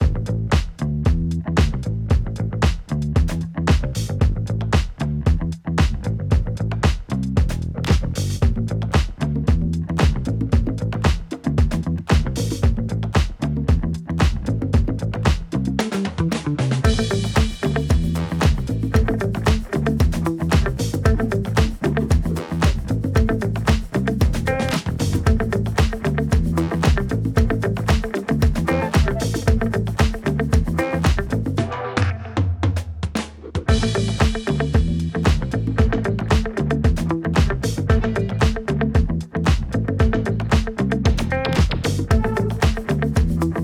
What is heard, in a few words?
Funky Groove; PROst; serginxs; PROst N95M EVO Series 2.0